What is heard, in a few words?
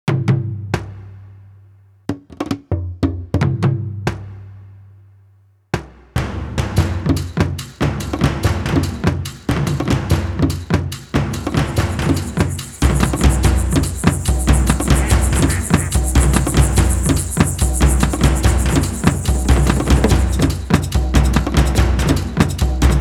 Rhythm Nature (Interlude)